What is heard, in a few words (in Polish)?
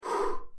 Zdmuchiwanie Swieczki; don t be afraid the first toy